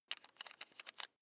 electric drill bit unfasten wrench turn

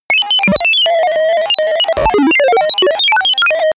chaos sine distorted

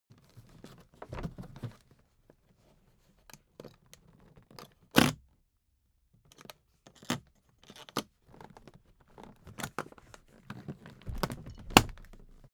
suitcase handling

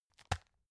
tissue package drop soft

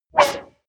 wipe

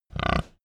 pig grunt

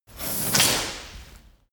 chain mail drop

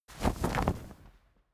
flag small flap

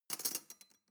cutlery teaspoon drawer place soft

